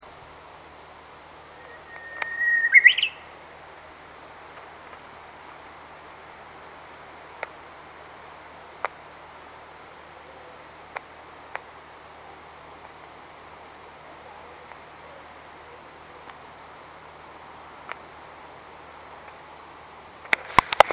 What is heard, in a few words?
bush warbler normal